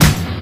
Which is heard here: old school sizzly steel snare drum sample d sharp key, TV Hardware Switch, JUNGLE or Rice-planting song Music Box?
old school sizzly steel snare drum sample d sharp key